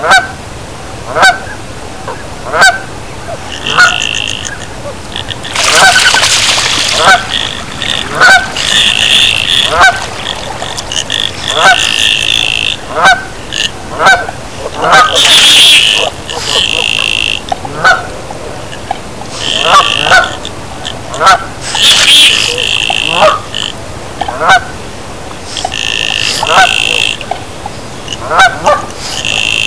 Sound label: goldeye